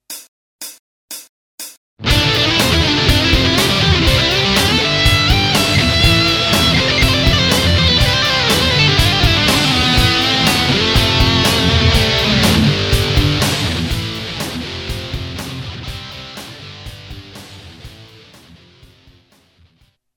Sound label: up and down; lickderwoche